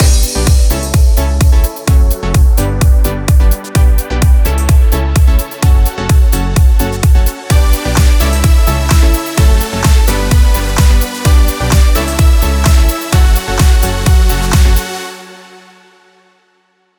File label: 128BPM Mix, Tech House; Full Effect; Vengeance Samples; Bonus TechHouse Kits